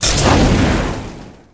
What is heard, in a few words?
Flame Web Fireball Shot; Weapons